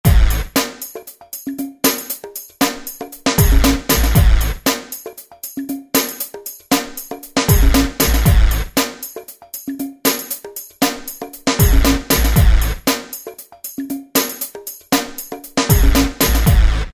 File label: Latin Funk, Korg ES-1 Factory Patterns